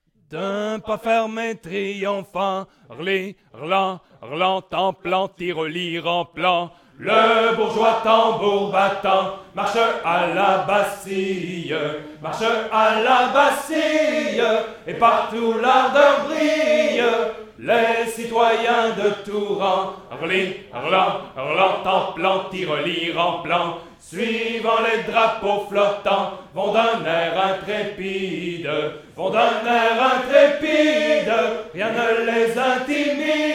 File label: Choir; assassin s creed unity gamerip 2014